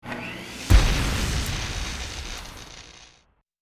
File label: electric engine explosion